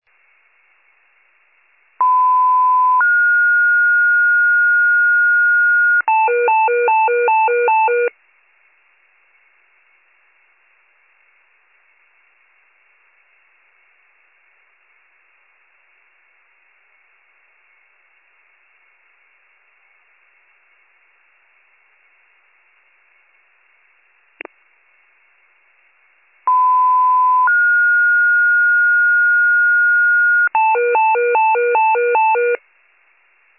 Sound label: fire, pager alert